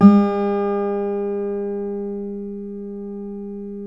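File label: GUILD 12-string, Guitars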